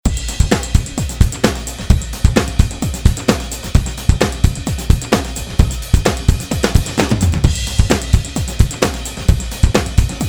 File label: Acoustic; Acoustic-ickle